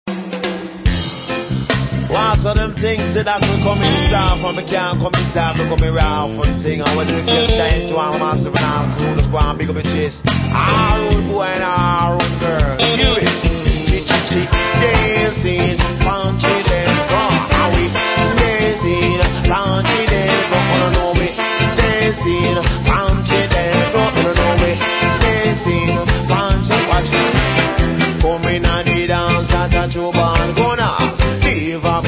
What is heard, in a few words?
Dub